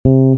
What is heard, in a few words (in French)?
basse; TamTamMicro.activity